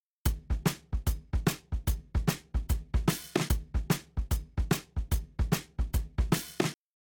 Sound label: Drums, shuffle drums; WDR2